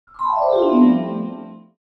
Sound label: Vibraphone gliss